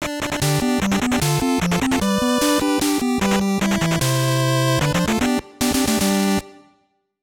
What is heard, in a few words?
Match Start; Glass Joe